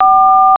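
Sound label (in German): Tonwahl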